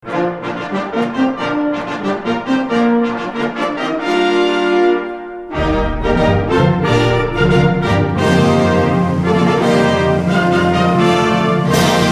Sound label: fanfare; lehigh